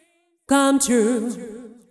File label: deep house female, 125, A, vocals